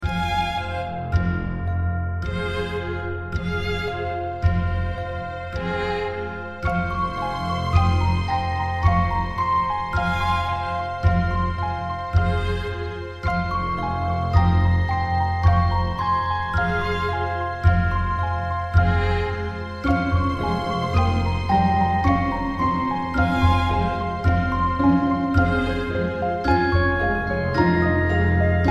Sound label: Puzzle; the da vinci code xbox 2006